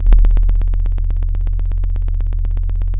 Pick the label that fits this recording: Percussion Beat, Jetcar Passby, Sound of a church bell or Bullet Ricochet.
Sound of a church bell